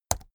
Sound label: keyboard laptop key press fast hard